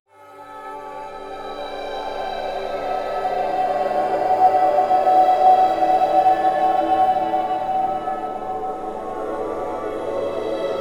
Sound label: Finish Loop